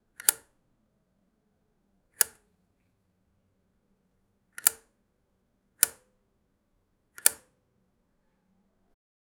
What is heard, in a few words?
light switch sound